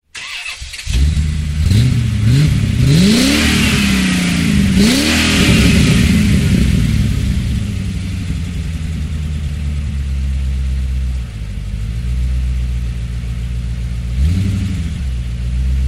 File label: Vehicle